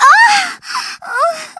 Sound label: death female